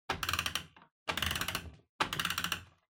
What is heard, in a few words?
Pendulum Clock Hardware Wind Up